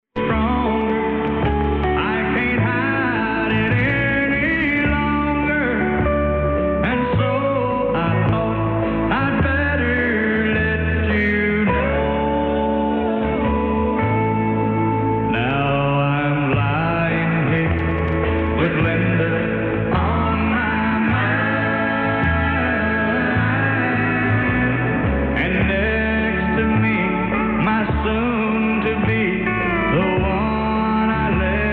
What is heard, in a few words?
distortion